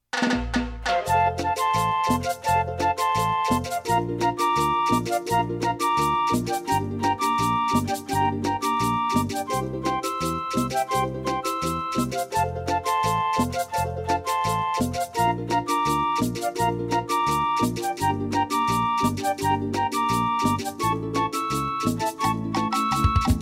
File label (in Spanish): Cumbia; ritmos